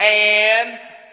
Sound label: and, SUNG